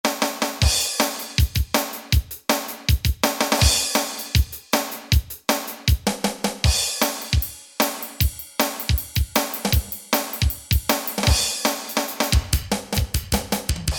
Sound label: Power Drum Set